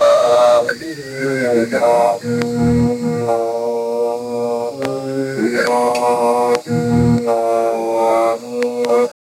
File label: a glitchy recording from, punk rock